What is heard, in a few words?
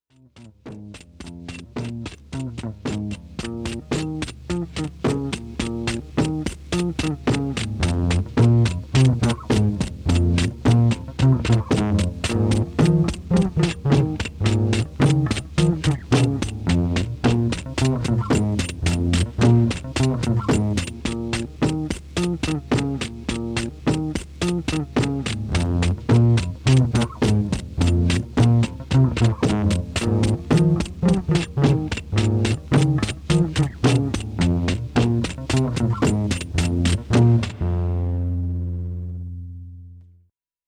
CAVEMAN DRUMS